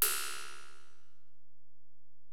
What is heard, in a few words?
Ride, Alesis DM-PRO, Drum Machines